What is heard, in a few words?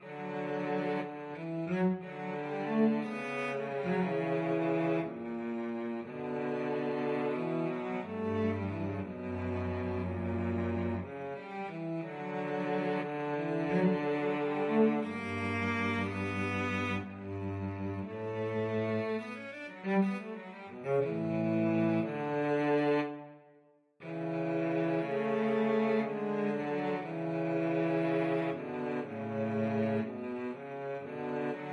duos adagio cello duet